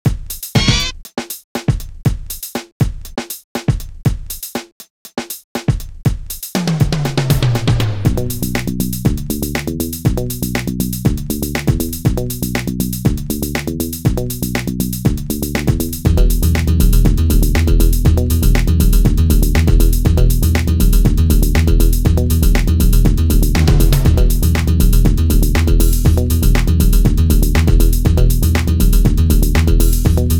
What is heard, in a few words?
Funky Music Test